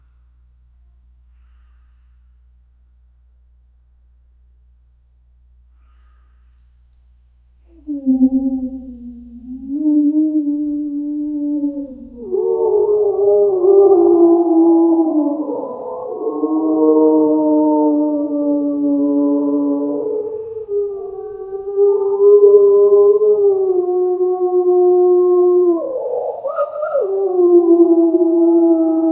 surreal ethereal deer lik

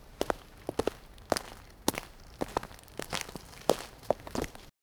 footsteps on forest floor; mushrooms

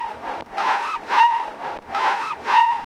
vehicle, sound-effects

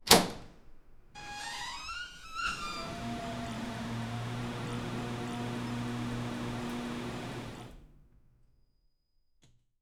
door airlock opening up

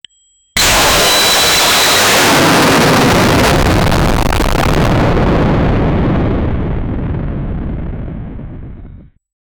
atomic explosion audio

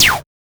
dash sound for a platformer

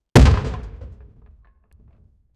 cannon ball impact ship w

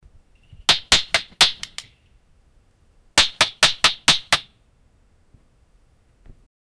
bow and arrow shooting; archery

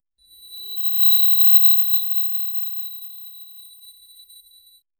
create a dynamic sound effect, electric

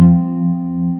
correct

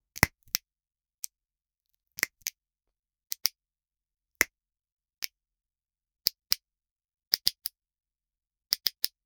short plastic snap sound; rigid

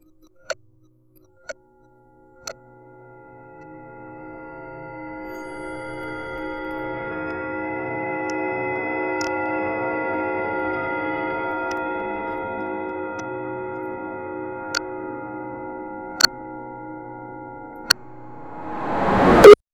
clock

